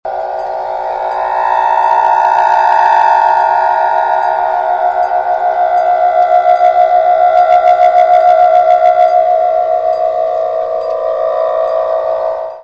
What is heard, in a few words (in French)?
harpe 2 fils poulie; berck 2004